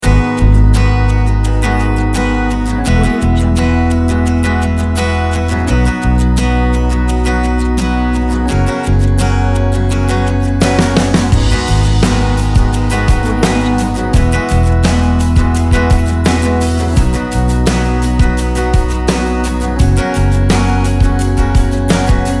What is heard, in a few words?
acoustic guitar pack